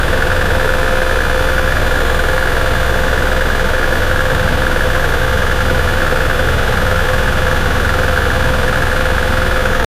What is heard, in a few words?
jeep engine running